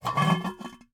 Cookie Jar, ceramic, glazed, irregular shape, hollow base, hollow top, rough, resonant, tonal, open; medspeed; Eiravaein Works; Vaeyan IV